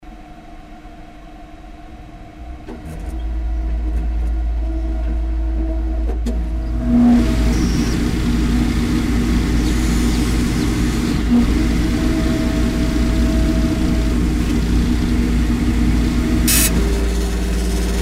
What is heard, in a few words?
motor